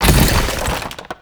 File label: Collision Fast, Motorcycle; Warframe; Vehicles; Motorcycle Ninety Nine